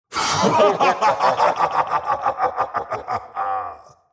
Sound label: Demon king lord maniacal laugh